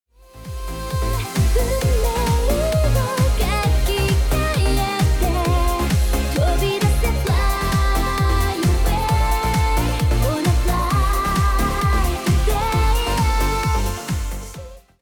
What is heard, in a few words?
smoooth